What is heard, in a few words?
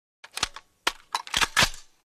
Load And Cock M-16 Rifle, Gun.